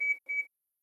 Button Radar

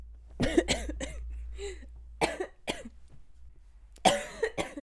Woman Coughing

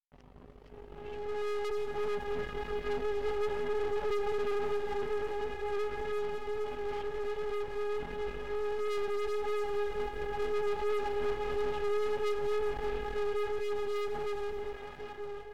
Distorted whine

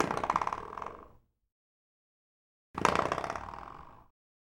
Household Door Spring

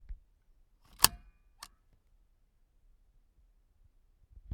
Seat Belt Click In

